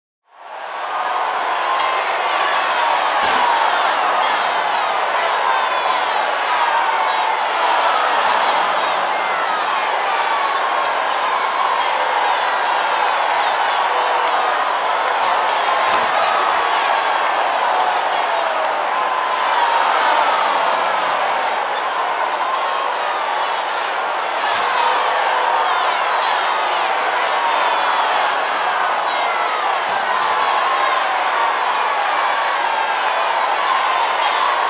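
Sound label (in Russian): гладиаторские бои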